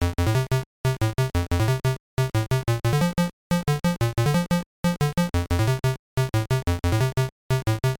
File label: stage theme; popeye